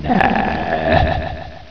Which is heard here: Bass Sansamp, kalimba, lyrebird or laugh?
laugh